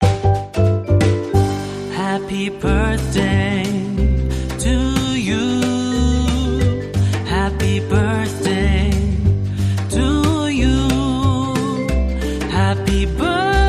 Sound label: Male Jazz Vocal Happy Birthday